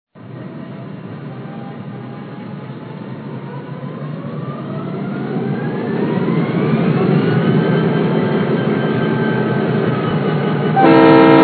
GP-35

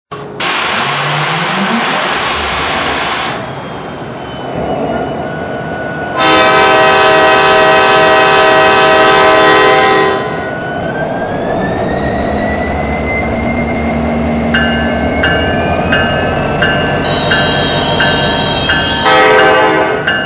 SD70ACe

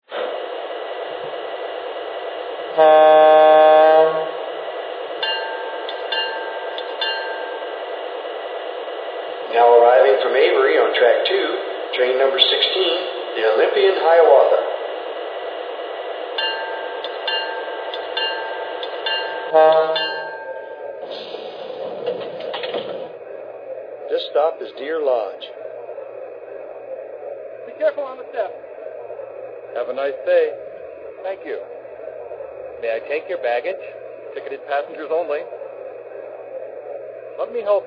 EP2, trains; 5722; MLW

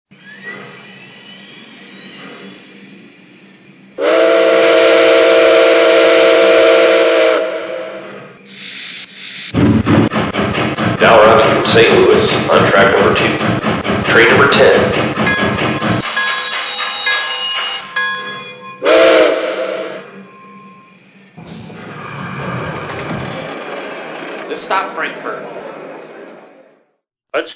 482 USRA

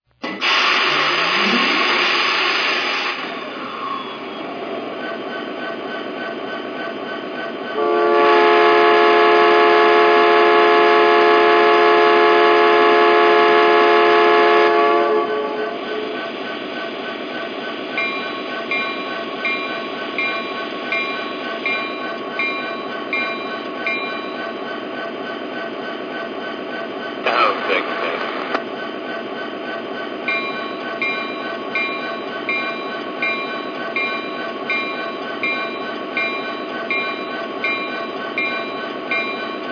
SD80MAC, trains

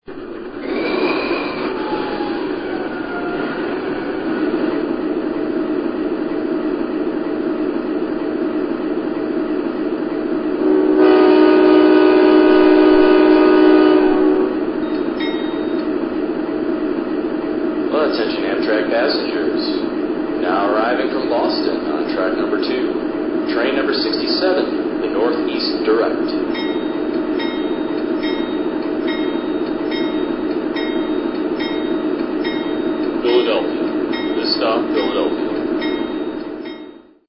Genesis

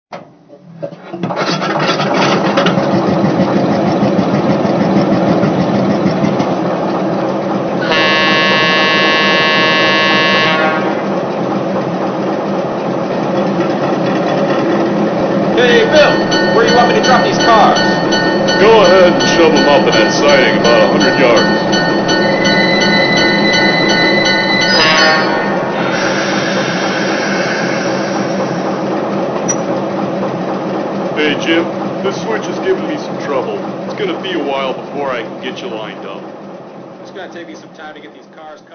GE 44Ton

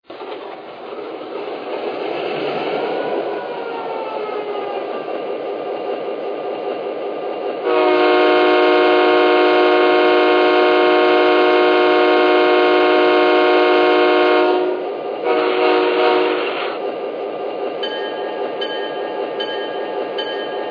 E8

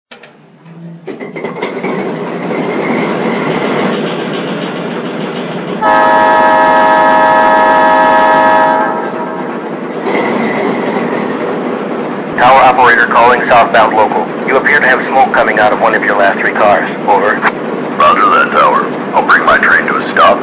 ALCO RS-3

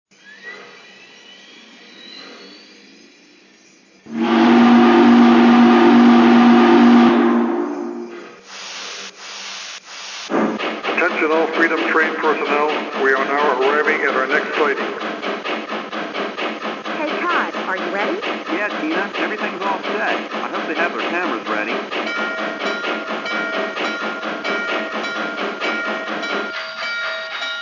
GS4, trains

